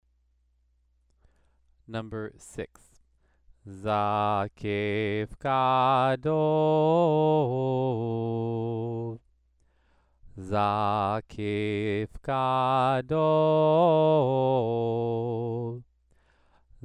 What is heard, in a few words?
Torah trope exercise